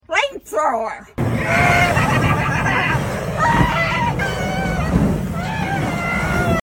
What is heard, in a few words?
Flamethrower; meme sound